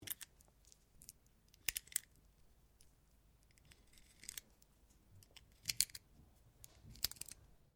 RIFLE ANSCHUTZ 67MSR 22 CALIBRE MAGAZINE LOAD; A selection of domesttic firearms; Rifles; Guns

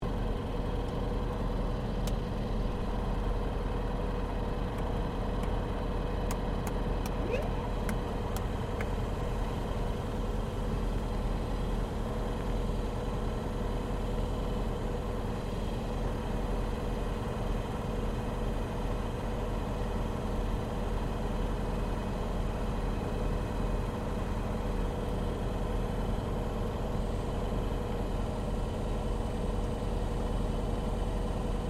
AIRCRAFT YAKOVLEV YAK-52 IDLE INTERIOR; Russian Yak 52 Training aircraft; Airplanes; Vehicles

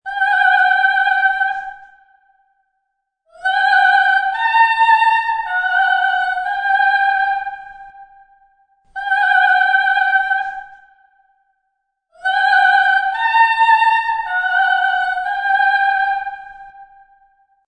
Operatic Female; Human Vocal Ringtone

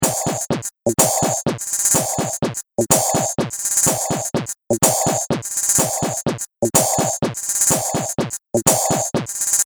Techno Beats 125 Bpm; Music Loop Sound Effect - Percussive And Rhythmic; Seamless loop

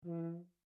HORN E4 MF SHORT STOPPED, French Horn in F; Alexander French Horn in F; Horns (Sound Effects:Musical)

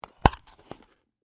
Plastic Case Open, Foley; Snaps; Objects

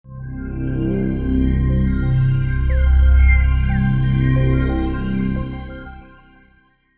Startup, Computer: Short Musical Style Phrase

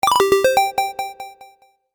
Game App Magic Collect, Video Game Sound Effects

